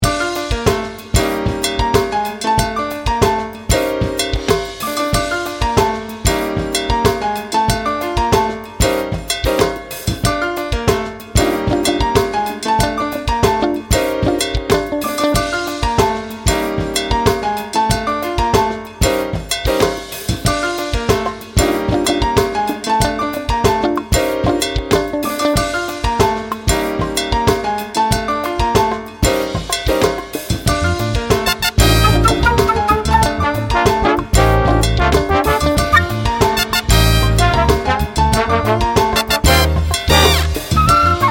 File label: latino radio